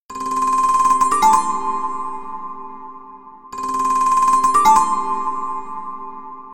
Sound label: Harmony; Ziox; Zi4004; alarms